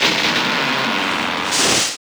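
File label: palm crash; lasvegas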